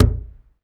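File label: UGANDA drum, Heart of Africa; Spectrasonics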